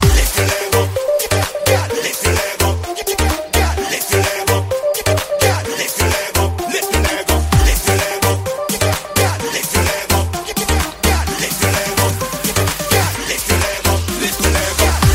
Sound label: Club Sound